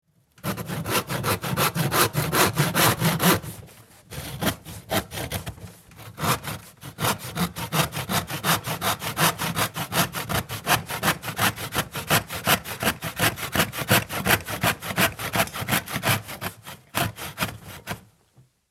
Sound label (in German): Styropor